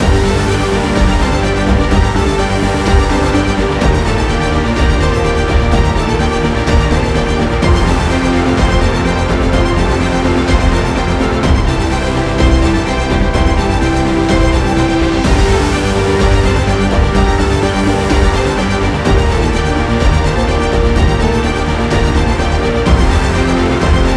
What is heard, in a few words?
tension, horror excitement thriller song